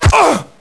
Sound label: Human Pain